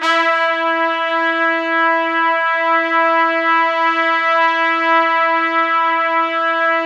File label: Orchestral Brass